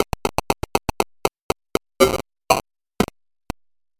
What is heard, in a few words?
Chip Stick; Retro Noise 3 Instruments